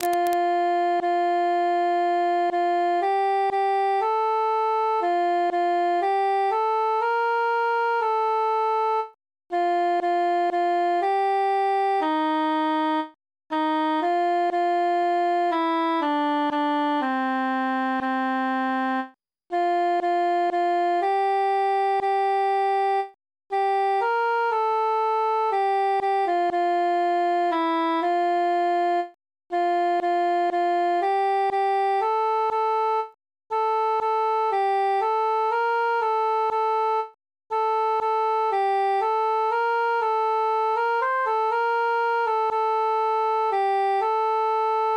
cantus solo

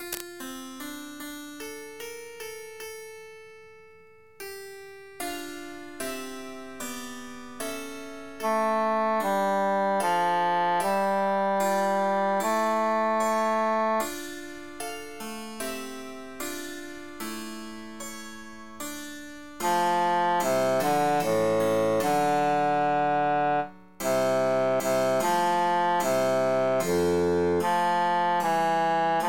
bassus emphasized; ave maria gallus

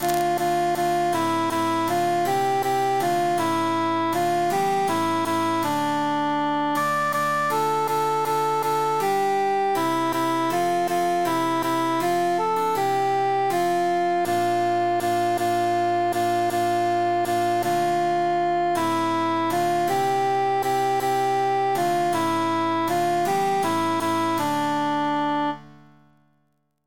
cantus emphasized; dindirin dindirin

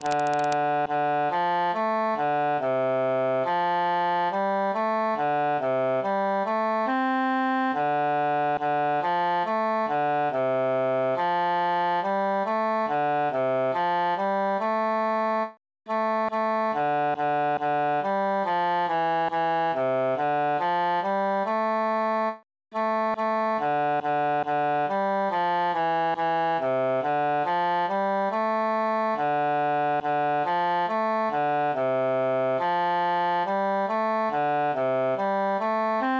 cantus mute; stella splendens

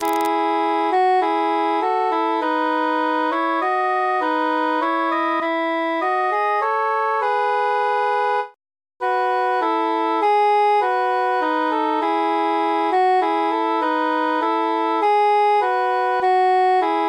transposed tenor mute; we be three poore mariners

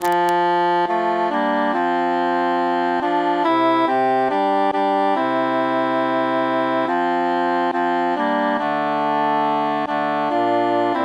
cantus mute; tant que vivray

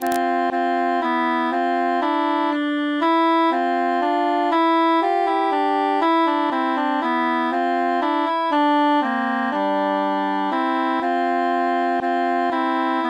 tenor mute; post partum virgo ave regina veritatem

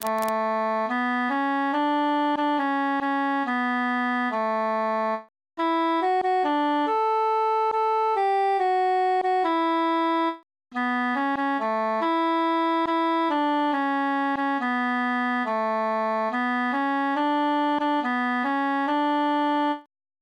altus solo; non nobis domine

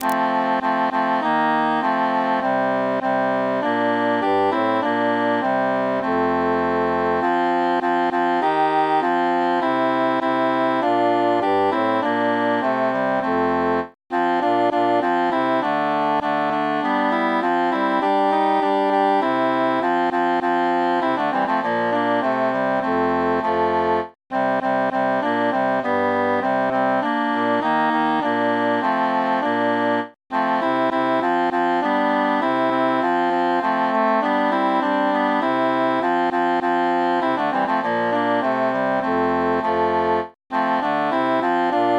cantus mute; matona mia cara

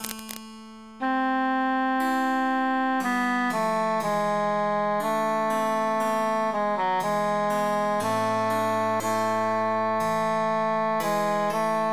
tenor emphasized; weep o mine eyes

